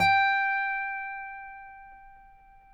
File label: GUITARS TAKA FINGER; Spectrasonics; Hans Zimmer Guitars VOL-1